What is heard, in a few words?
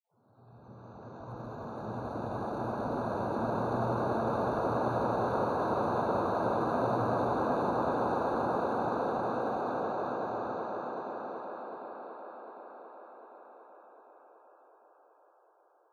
Library Echo Pad